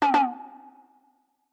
Accent

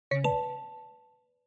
Smartphone UI Sound Dry

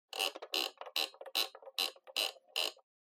Flip Clock, Alarm Dial Fast

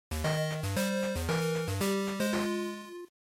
Action Game Over

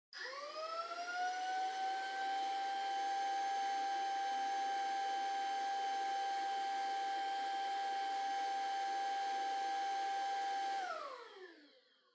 Vacuum Cleaner Far Vacuum Low Long; 掃除機04-30(遠い バキューム 低 長)

